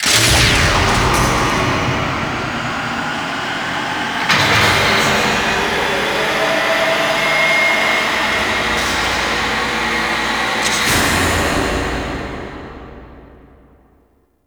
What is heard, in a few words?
Retrieval Core Tram Activate; Warframe; Missions